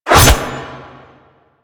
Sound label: Machete Whoosh; Warframe; Weapons; Melee; KahlMachete